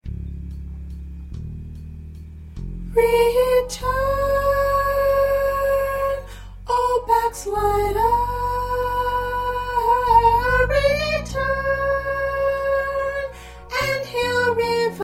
Soprano

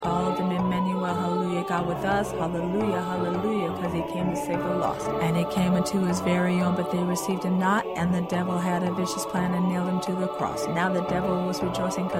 Ensemble Voice 3

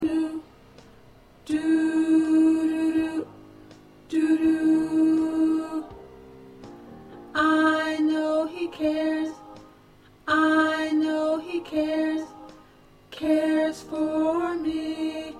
Alto

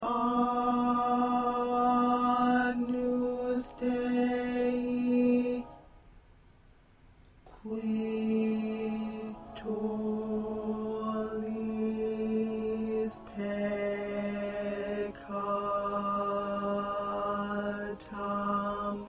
Tenor 2; sacred classical pieces; part preview

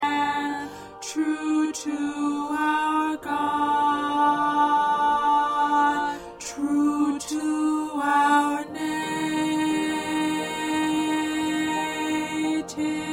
2nd Tenor

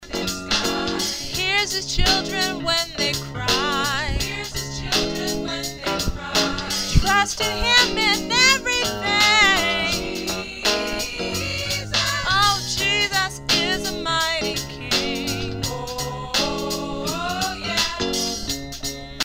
Lead, choir parts

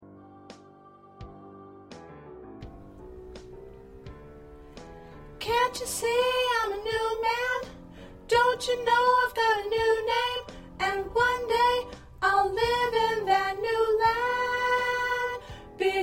Alto, choir parts

